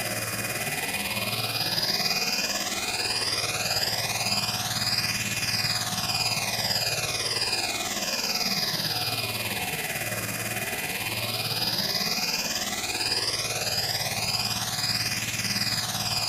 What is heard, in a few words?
giant fan turbine phasor; Titanfall 2